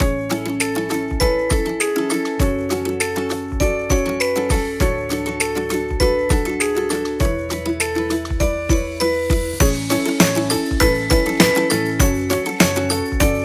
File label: Funny Kids Mood Loop